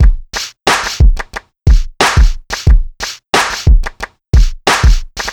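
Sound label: Filter Piano; сэмплы битов; хип хоп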